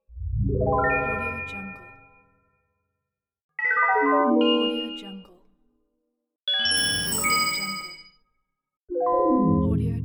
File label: magic